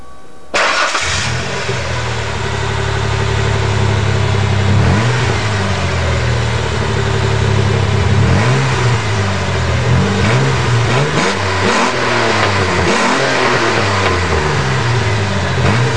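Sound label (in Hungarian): ford ranger 4000, Kipuff hangok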